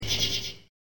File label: crickets